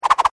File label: Foley Whip Whoosh Multiple; Whip Sound Effects; Whips (Sound Effects:Sound Design); SFX Bible 6